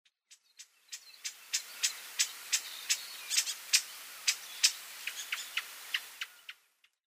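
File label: Grey-backed Thrush; karaakahara; sakata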